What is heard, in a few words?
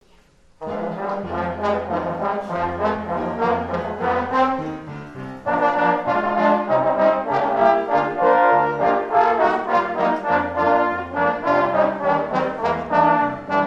trombone choir